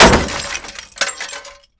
break

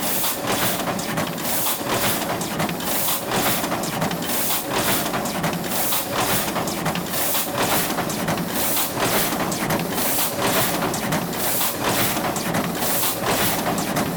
ambience

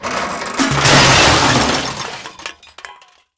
crash

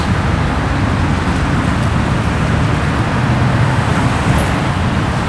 street, looped

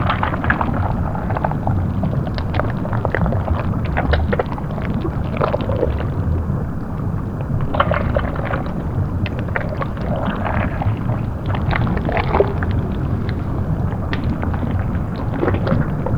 ambience toxic waste

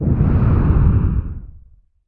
woosh

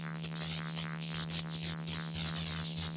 loop electric zap hum short

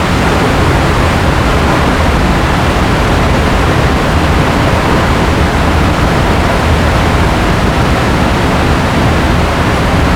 ambience waterfall

